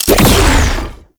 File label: Ink Rifle Shot, Weapons; Warframe; Tenno